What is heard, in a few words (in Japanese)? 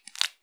くちゅ音単発, ASMR